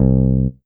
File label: Yamaha TG500 BA Fingr C2